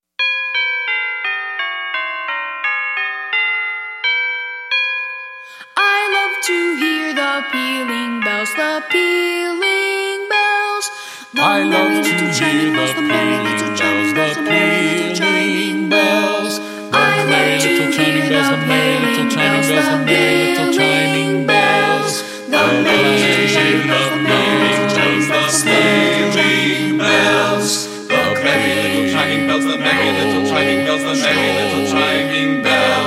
Pealing Bells round; A Beka

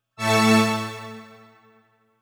pad

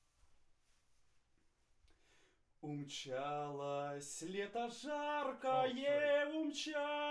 vocal add condens

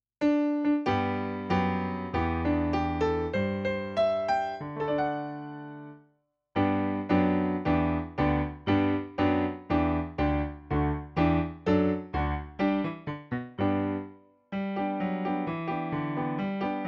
Piano

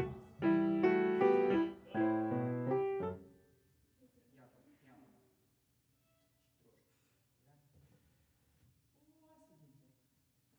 Piano

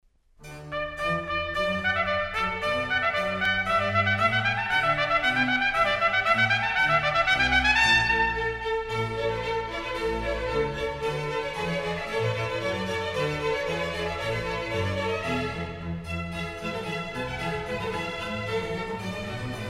Trumpet Concert in D major, Allegro; Georg Philipp Telemann; André&Karajan

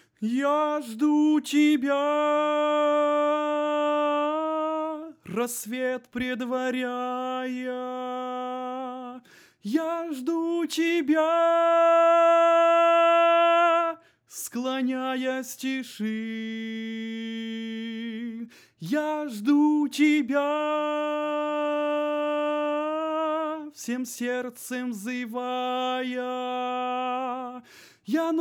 tenor

